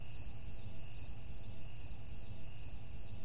crickets loop; phet io wrapper sonification

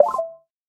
reset all; phet io wrapper sonification

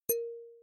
radio Button Balafon Bright

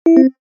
neutron drop; build-an-atom

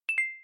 particle Drop; build-an-atom

